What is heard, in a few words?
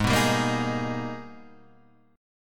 Ab Minor Major 9th; AbmM9; strummed